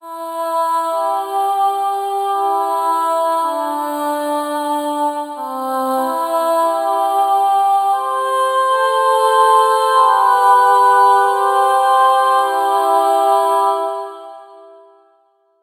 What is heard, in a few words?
Natural Ahh; KORG OASYS; oasys assault